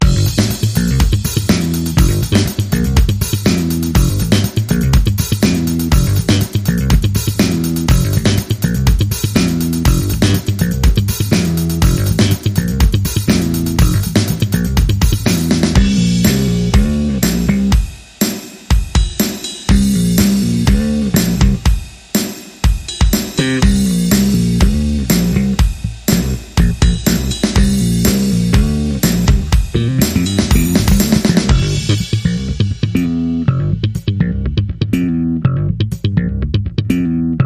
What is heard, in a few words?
Groovy Jam 25, Bass and Drums